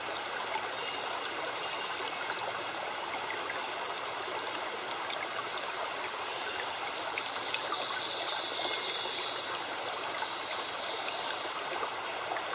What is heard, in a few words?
Stream